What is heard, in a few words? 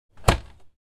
Washing machine washer open door; appliances